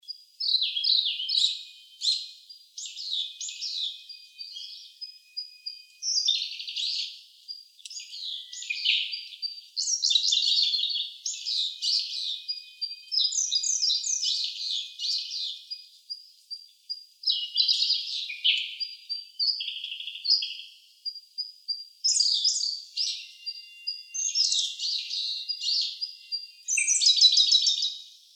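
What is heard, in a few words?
koruri S, Siberian blue robin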